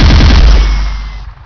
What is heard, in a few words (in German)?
Gewehrfeuer; RPG Maker; Wartools v1.14